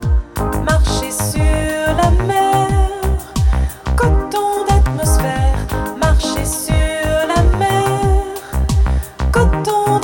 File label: lens cocari wet